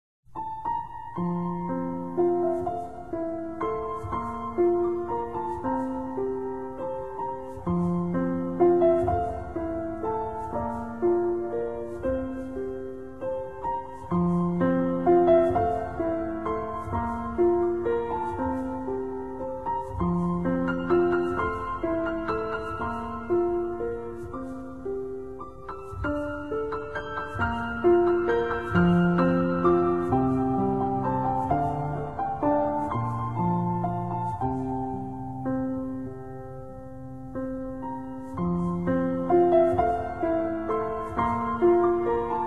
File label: Waltz Piano Solo